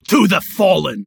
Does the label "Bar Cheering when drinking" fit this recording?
Yes